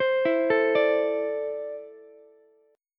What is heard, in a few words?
E 7th Suspended 4th Sharp 5th, Ukulele; E7sus4#5 arpeggiated; JGuitar